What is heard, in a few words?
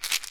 Pills; Phasmophobia